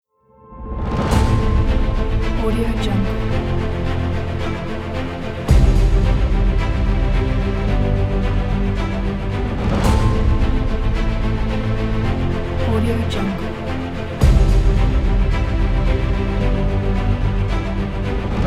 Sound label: cinematic epic trailer